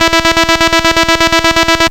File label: Pitfall Trem E3; Ableton Foundation FreePacks; Foundation Glitch Toys & LoFi